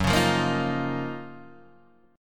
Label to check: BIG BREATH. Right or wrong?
Wrong